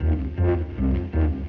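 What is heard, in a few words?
electric guitar, ethno, 160; eJay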